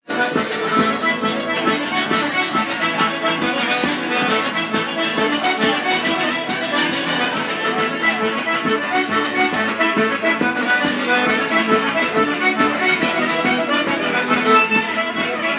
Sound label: trad session